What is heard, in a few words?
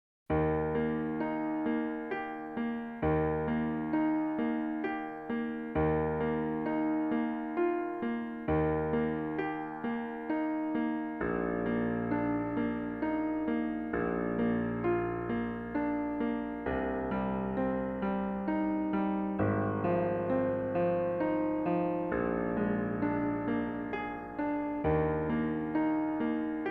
menuet de larlesienne piano